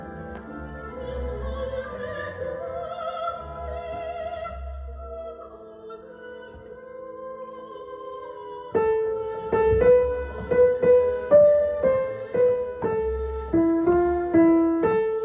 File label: piano; Mendelssohn; Soprano 2